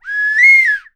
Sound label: BRD CAL, BIRD CALLS, Percussion; Voice Crystal - Steve Reid Definitive Percussion